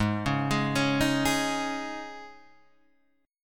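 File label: Ab Suspended 4th chord, Guitar, Drop D tuning; down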